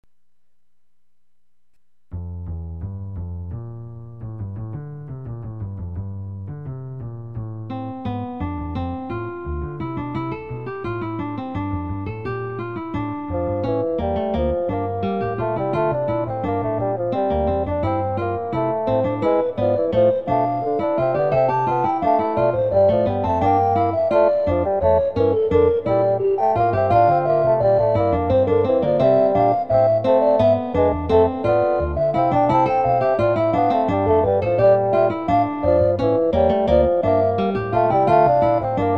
fugue; lachapelle claude

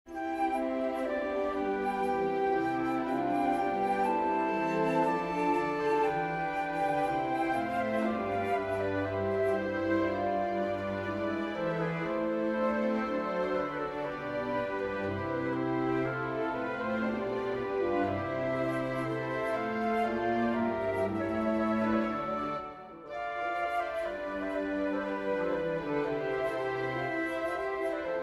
for winds strings; purcell henry; his right hand shall stand the queen

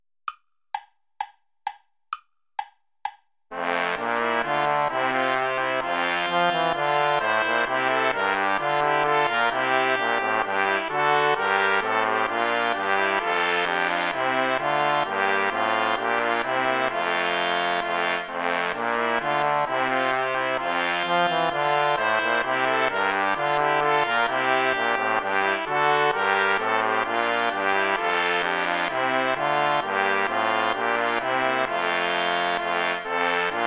ballet play along without trombone; praetorius michael; ballet des feus

